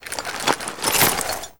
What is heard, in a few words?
defuse; Call of Duty Modern Warfare